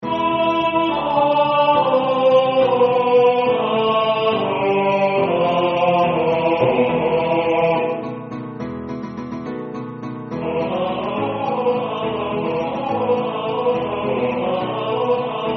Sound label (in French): Bas Solo; Los Pastores